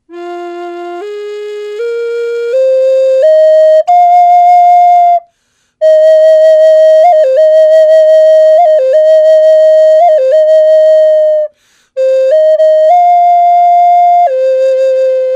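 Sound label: natural, wood flute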